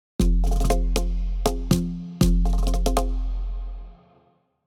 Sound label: Nature tropical logo intro